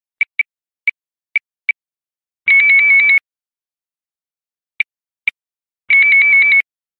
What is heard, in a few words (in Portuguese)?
som de urna eletronica